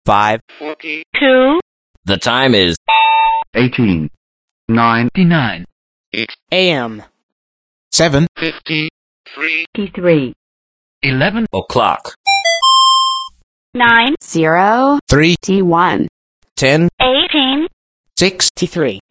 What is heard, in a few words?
randclock